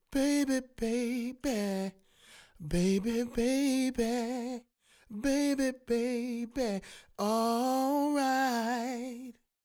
vocal off

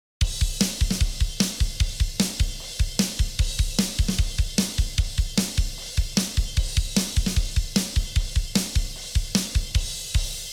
drum On

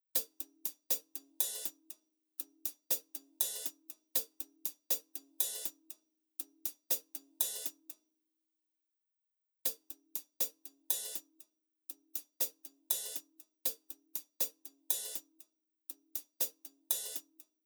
hihat

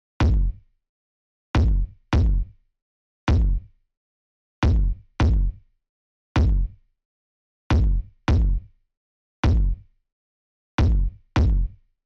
kick

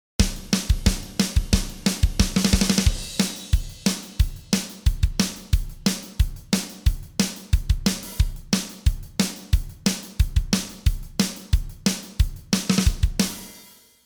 drum Solo Off

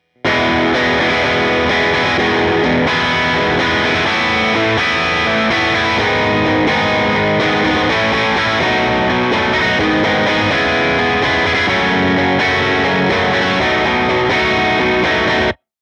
drive

